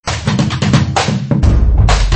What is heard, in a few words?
Hip-hop Band